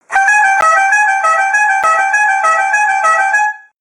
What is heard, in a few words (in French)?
son klaxon le tour de france